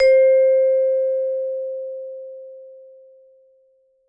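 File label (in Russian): вибрирующий звук ноты ля 2 октава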